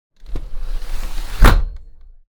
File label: close, doors sliding; dragonroll